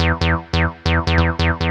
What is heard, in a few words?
bass; Techno Music Maker